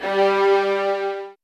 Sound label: G, soft violins; Q Up Arts; Denny Jaeger Master Violin Library